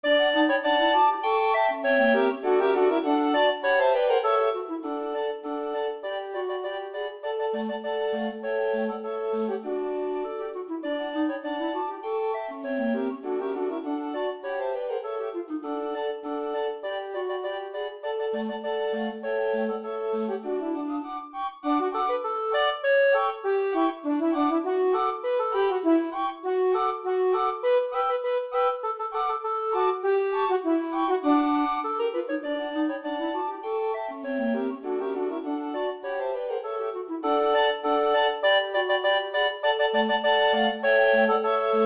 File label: D Maj, quartets; Greased Pig Quick Step